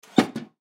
Ambulance Rear, Ambulance Rear Step Put Up; Emergency; Emergency Vehicle Sound Effects

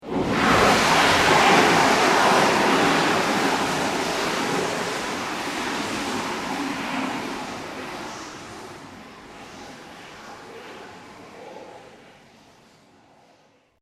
Alien Impact Destruction; star wars / trek style games; Andrew Fleming

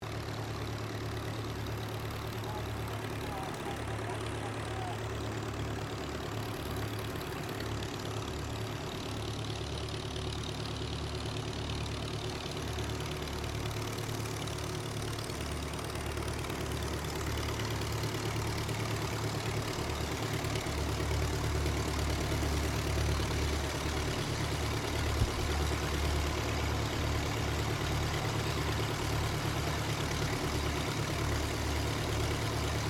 AIRCRAFT DEHAVILAND DHA-3 DROVER IDLE, tri engine; Airplane; Vehicles; Airplanes; Aircraft Collection; Twistedtracks

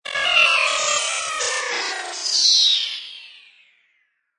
Digital Matrix Whoosh; Scifi Production Elements; Scifi Production Elements, Set 1; LynneMusic

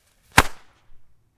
FIREWORKS CRACKER SMALL SINGLE; Variety of fireworks being detonated

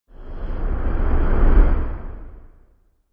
Whoosh; Whooshing sound for video editing, movements, logos, flash, or animation; Sound Design

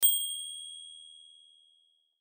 Typewriter Bell End Of Line Ding; Miscellaneous Office

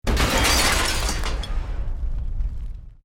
EXPLOSION MEDIUM METAL GLASS

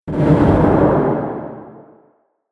Horror Explosive Impact

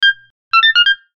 Logo Toy Piano Stab; Sonic Logo Sound Effects; Production Element Imaging Element Accent Transition

